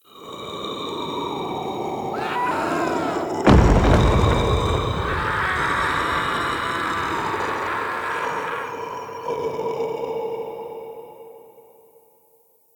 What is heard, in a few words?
farmhouse mystery forest